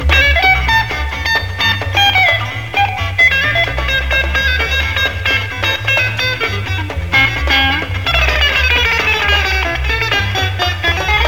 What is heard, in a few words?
beginning guitar; ringtone; ren stimpy